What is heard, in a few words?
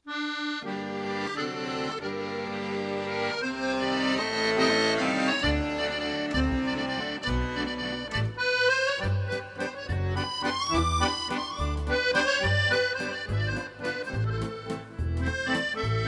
accordion